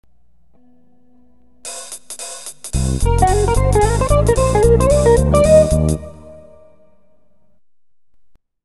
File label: jazz